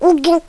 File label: baby; Game Maker 8